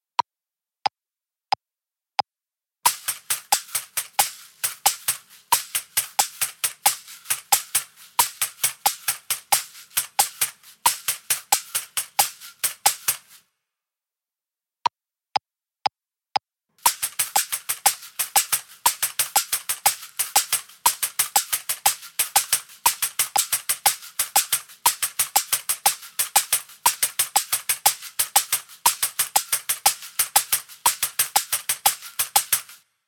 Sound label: Caxixi; shakers